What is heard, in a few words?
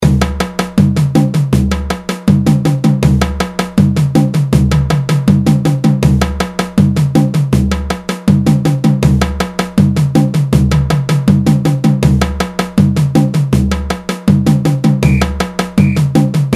Samba Afro w Break; Samba Reggae